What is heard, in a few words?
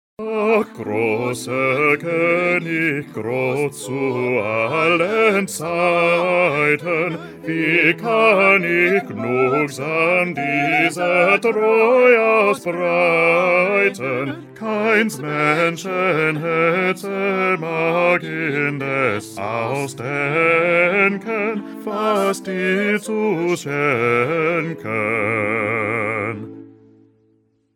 Bass